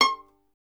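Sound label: STR violin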